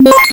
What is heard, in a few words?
Coin; R Type LEO